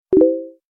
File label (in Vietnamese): Âm thanh thông báo facebook